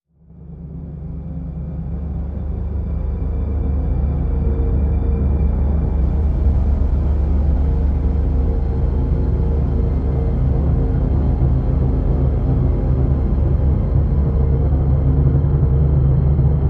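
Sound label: Drn C, drone; Fathomage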